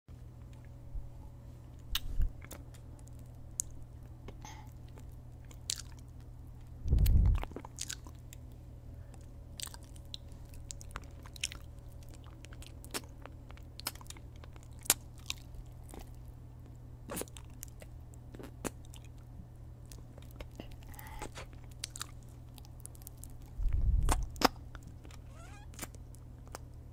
Dark Screen ASMR Mouth Sounds - Passive sucking and spit No Words; Lewd SFX